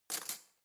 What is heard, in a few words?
cutlery spoon drawer place